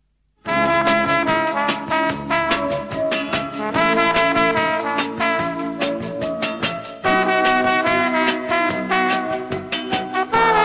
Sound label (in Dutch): Wel of geen glissando zonder glissando